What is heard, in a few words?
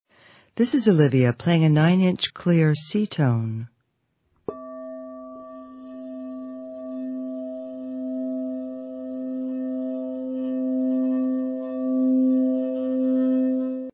c tone